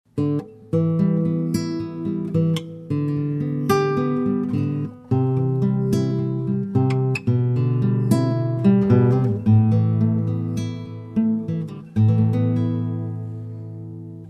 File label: C3 classic